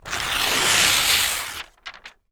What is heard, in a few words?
PAPER, TEARING PAPER; AKAI S6000 CD-ROM - Volume 6